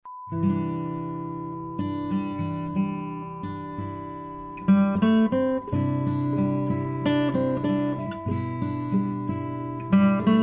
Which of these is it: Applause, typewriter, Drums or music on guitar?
music on guitar